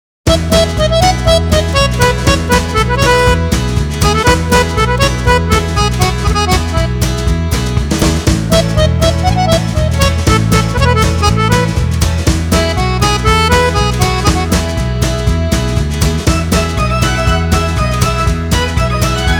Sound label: cheerful guitar comp; by burak sound bank; cheerful vol 6